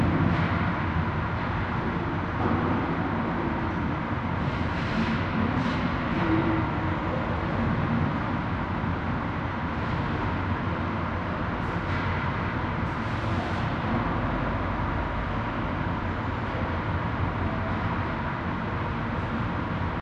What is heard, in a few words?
ambient port default; World Of Warships